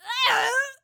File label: female death, human